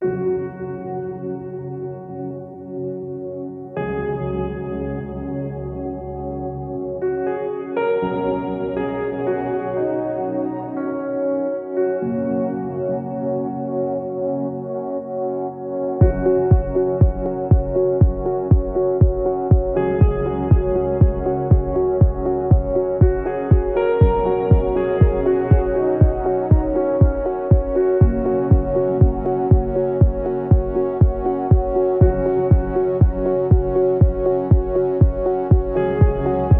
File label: sirens